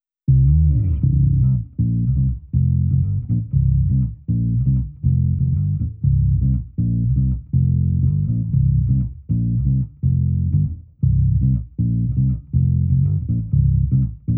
Basses 120; Dew Point

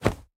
Weapon Cloth

